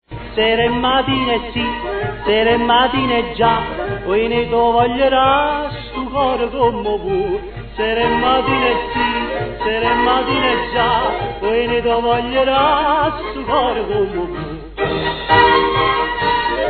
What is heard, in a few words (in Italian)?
Tarantella